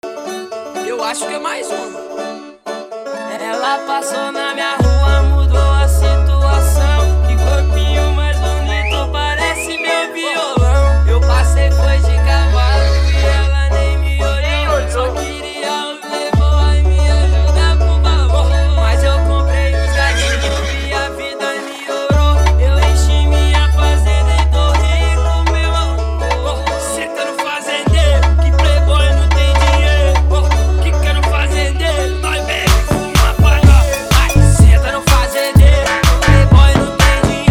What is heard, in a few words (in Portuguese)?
alto falantes especial funk mix; Triton alto Falantes